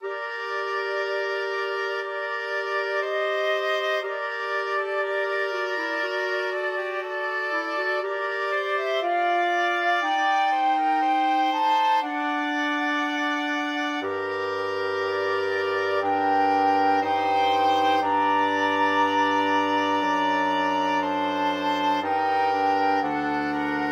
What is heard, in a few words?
flexible mixed ensemble 4 players